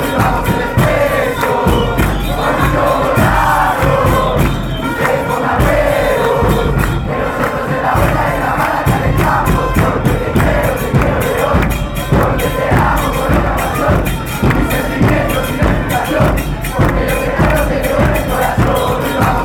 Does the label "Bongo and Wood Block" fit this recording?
No